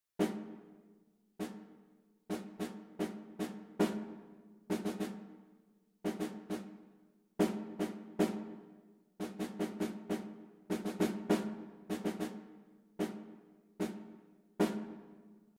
tentamen ritmisch, solfege

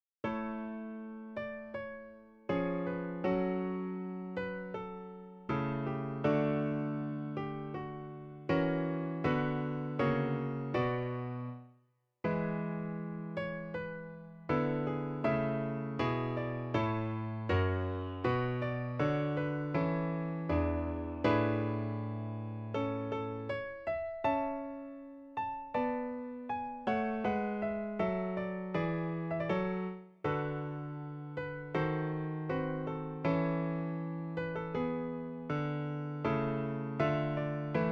tentamen harmonisch, solfege

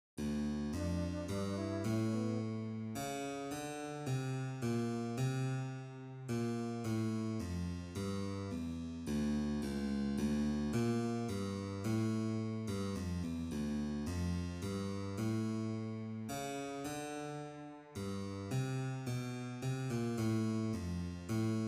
Karaoke d Allegro; solfege eerstejaars zingen ritme

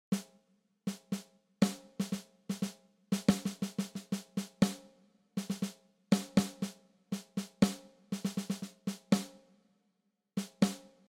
tentamen ritmisch, solfege

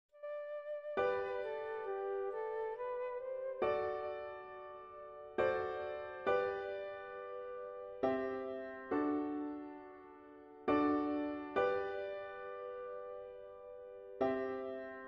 Karaoke g Adagio; solfege eerstejaars zingen ritme

